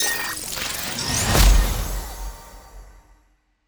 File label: Reward